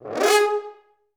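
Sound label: french horn G#3; Best Service; Peter Siedlaczek's Advanced Orchestra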